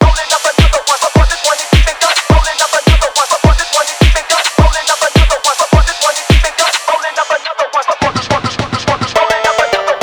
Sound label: phonk